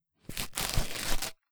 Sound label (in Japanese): びりりー